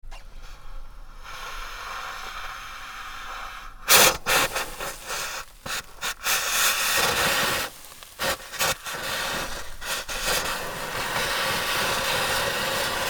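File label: radiator boiled over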